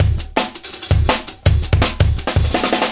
live thick and roll, drum loops and breaks, 165 bpm; 161 to 180 bpm